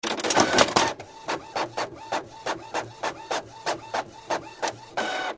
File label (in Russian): Печать мфу принтера